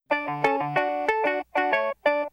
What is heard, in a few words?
notification